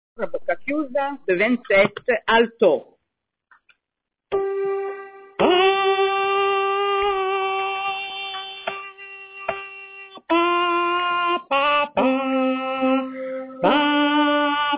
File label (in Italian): coro alto, Coro a bocca chiusa; Madame Butterfly Giacomo Puccini